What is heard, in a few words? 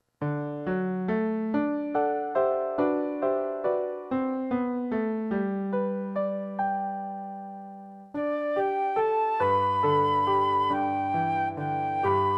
G, high, piano acc.; Down in the Valley